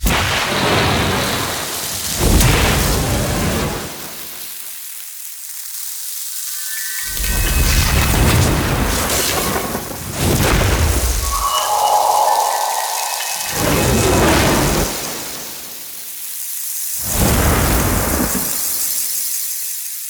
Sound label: Planet Accents; Narmer Outpost